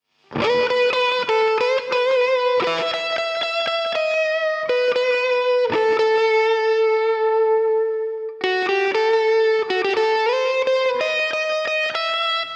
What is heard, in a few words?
lead guitar; Lucha Rumble